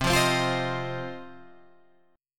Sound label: C Minor Double Flat 5th C down Guitar Open G